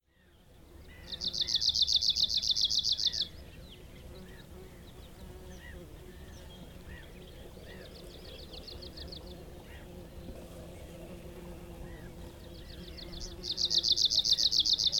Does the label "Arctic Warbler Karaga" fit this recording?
Yes